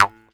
KHOMUS M, KHOMUS NT; USB Soundscan vol.60; Asian Traditions; AKAI

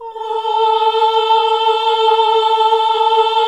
OHH A2, CLASSIC VOX6

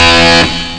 sweet guitar; ST-XX Amiga Soundtracker Sample Packs

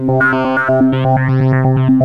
SAMPLE HOLD, KORG WAVESTATION; Rubber Chicken Software Sample Bank

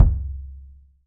kick, 3D Drums; RUBB KICK

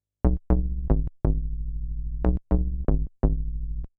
SH101 Bass Loop (120BPM), retro house

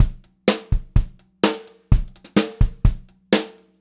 126 PO, Pop-Rock Drumloops

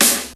SNARE, TECHNO; Crypton - DrumBase5000; TECHNO #2

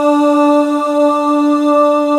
Male Ahz; 90s Sample CDs; Club-50 - Foundations Roland

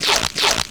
RUBBER GLOVE

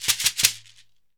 SHEKERE SH, Synclavier Percussion; ILIO; Synclavier Percussion 2; World & Orchestral

